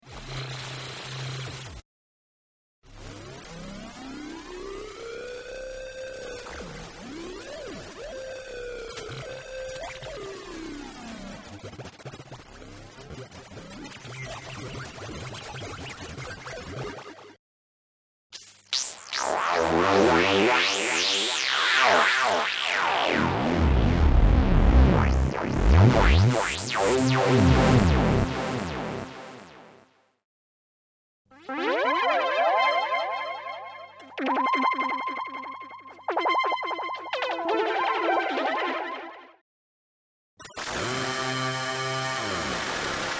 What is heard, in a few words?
Kaossilator